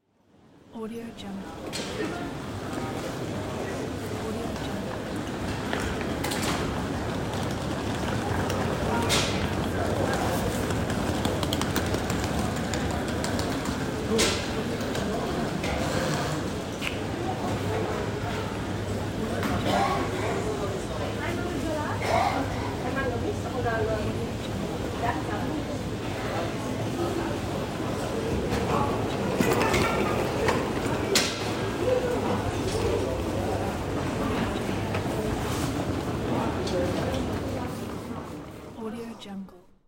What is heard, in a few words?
airport